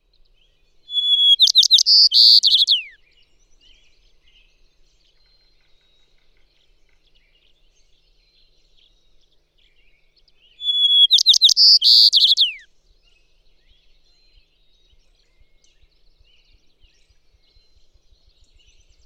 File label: White-crowned Sparrow